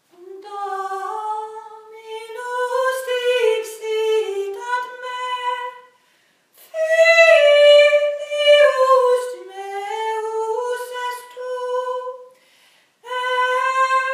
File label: tenor; Domine Deus